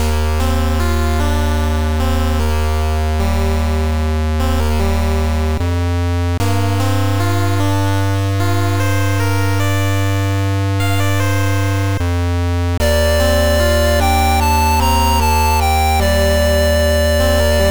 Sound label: 8bit Under The Moon